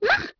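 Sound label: female angry; People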